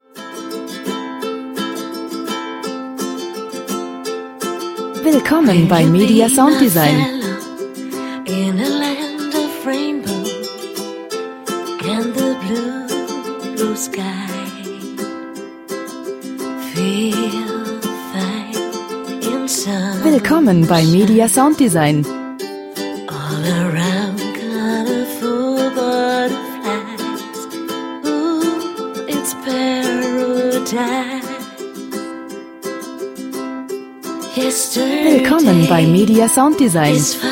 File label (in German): gesang